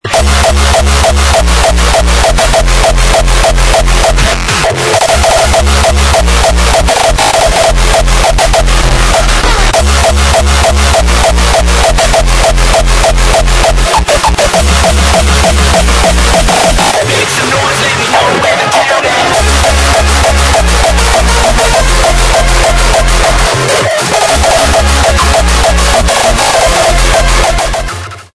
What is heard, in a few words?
rawstyle fire power